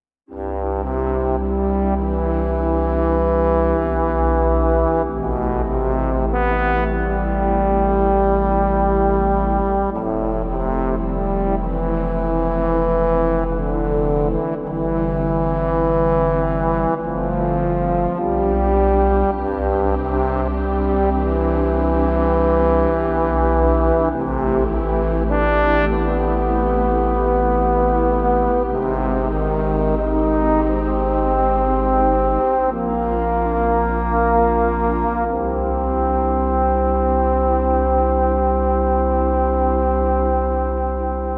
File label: Trombone; VIVO S10